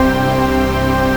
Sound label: dance chord